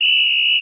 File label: whistle